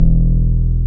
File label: D10, Synth Bass, LA Syn Bass